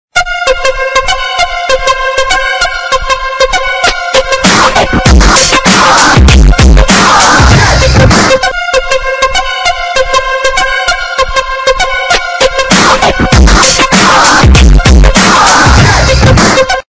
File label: bass; Тесты